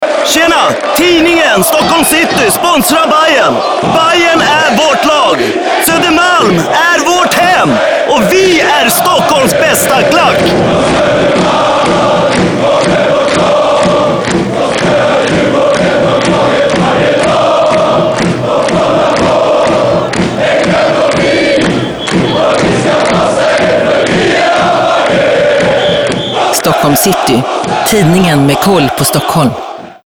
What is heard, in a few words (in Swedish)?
egna klacken; Bajen